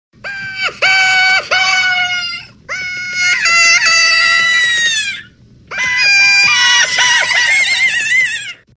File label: Scream; Vodafone; Zoozoo; TV ADS Tone